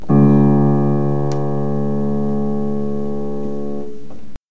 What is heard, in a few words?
Piano